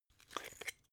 glass pepper open; berufe; gastronomie; soundpool

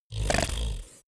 monster snoring short

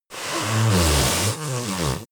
yeti nose blow strong

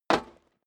step boots single metal dry single stair up; Footsteps; schritte

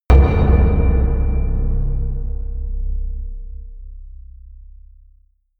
sub metal impact, hits impacts

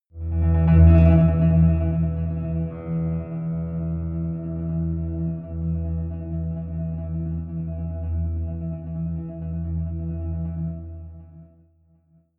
rattling piano drone, drones

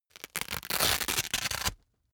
boxing gloves open velcro; sport freizeit; boxen

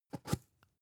spice jar big scratch close short; industrie wirtschaft; gastronomie

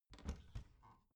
bathroom cabinet door creak open heavy

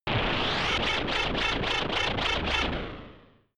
shot wobble lurking tension; pulsieren

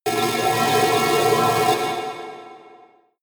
alien construction machinery

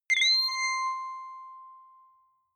click ready boot high

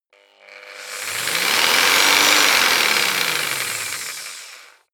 jigsaw engine medium slow medium length

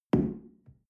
shell place on tablecloth medium; soundpool

